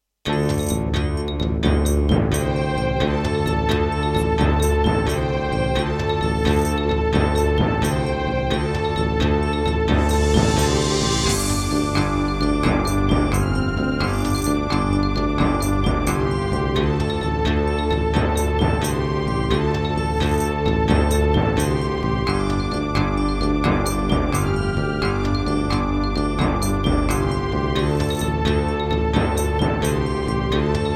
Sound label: Terratec WaveSystem SCW001-2, wavetable; Heretic